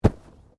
Bodymovement Fall Light

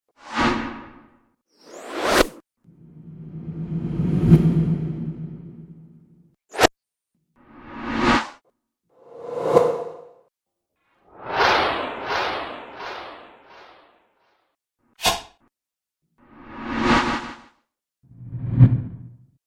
Whooshes; Whoosh Sound Effects; sound design

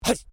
MALE VOCALIZATION HAA; Exclamations; Voice

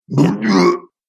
Alien Monster Dying Sound; Alien Sound Effects

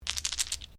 Dice Mixed Shake Hands; Variety of dice sounds; Casinos

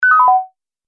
Multimedia Event Notification, beep sound effect

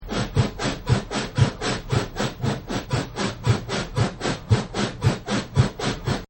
Squeeky Bed Loop; Household; Partners In Rhyme

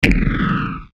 Hit Flange Filter, Hit Flange Filter Descend; Blastwave

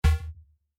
Digital Error Beep Wave Hit; Digital Clicks, Blips & Alerts; Digital Sound Effects

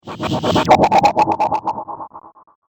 Bionic Man, robot; Robot Sound Effects

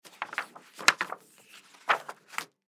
X-Ray Place In, X-Ray Place In View Box; X Ray Machine; Emergency

